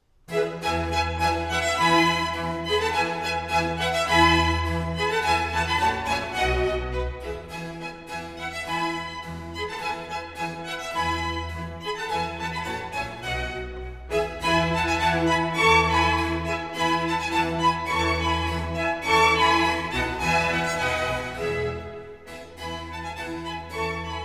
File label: Violin Concerto in E Major; Stuttgart Chamber Orchestra; Rainer Kussmaul